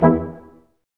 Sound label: BRS Quintet stac; Roland L-CD702